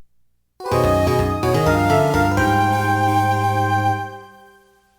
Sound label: jingle